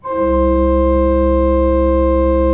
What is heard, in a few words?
C1, Cathedral Organ